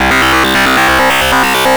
GRUNGE

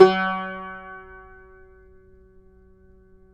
CUMBUS F#, Guitars; Spectrasonics; Hans Zimmer Guitars VOL-2

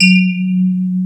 Electric Pianos; Wizoo Electric Pianos

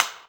Wood, Block Stick; Equipped Music; Loungin House

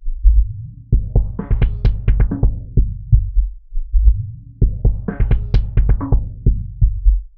Beat, Drums, Eclectic Electronica

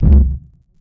KICK UNDERWATER

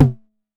909 TOMS; Wizoo - Platinum 24 Electronic; 909 TOMS P1

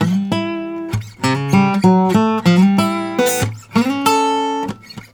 Blues Guitar lick; ILIO; Fretworks; 96 HIRUM LICK

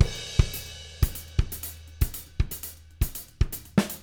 120, Living Drums, CARIBE

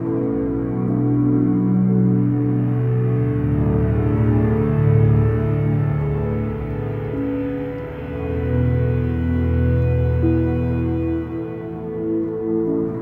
Acoustic Drone, Long Loops

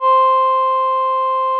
VP-330, String Machines

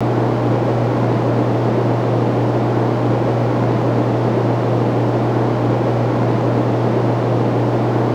room tone; E-MU Producer Series Vol. 3 – Hollywood Sound Effects; Ambient Sounds; Room Tones

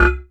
BONK HIT, HAMMER PERC; Zero-G Ambient 1

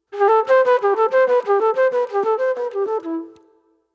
Soul Plate Flute

